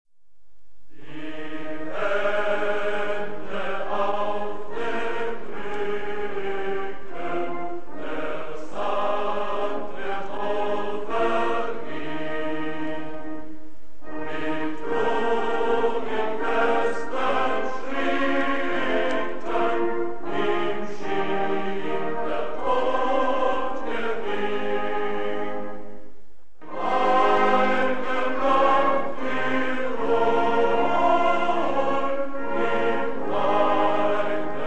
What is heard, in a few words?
Fans normal; AOS RF1 Kart Demo